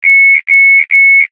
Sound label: pip